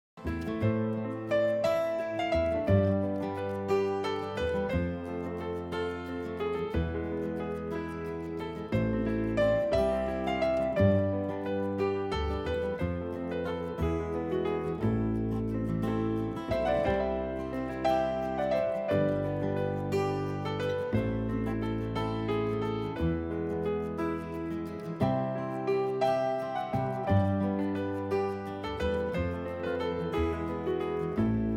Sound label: Binary Form